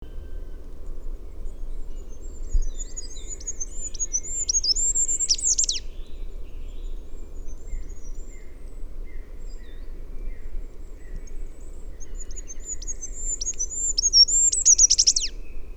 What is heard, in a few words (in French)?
roitelet huppe